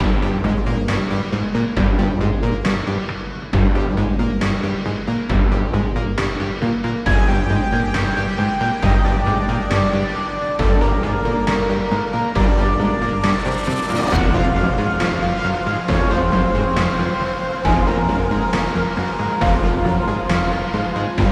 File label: music combat mid; Spacebase Startopia